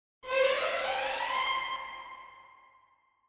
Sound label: 1st Violin 8va Up